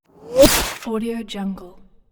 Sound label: bullet piercing sheet metal